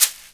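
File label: rainstick, percussion; ILIO; Synclavier Percussion 2; World & Orchestral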